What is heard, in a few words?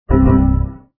Windows XP Battery Critical